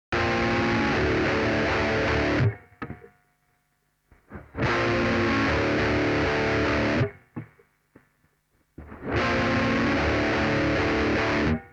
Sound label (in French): guitare satu